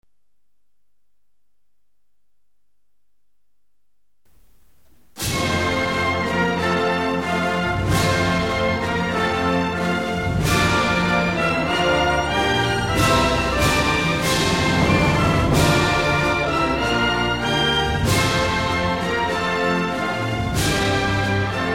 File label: armenian anthem